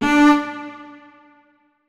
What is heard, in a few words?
notes, keyboard strings; Hideout Furniture